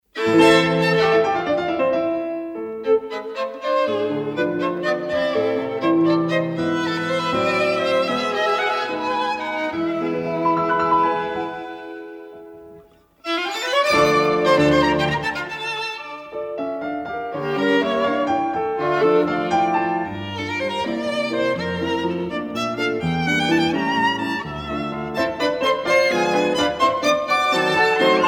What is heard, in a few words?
trio; mendelssohn